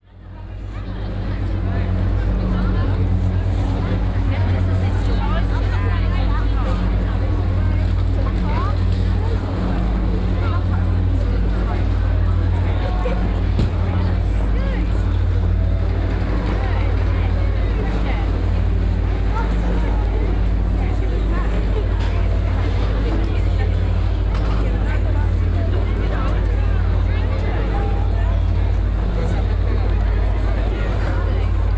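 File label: birmingham chinese newyear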